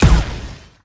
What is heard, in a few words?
Blaster; Weapons; Thalassophobia Restored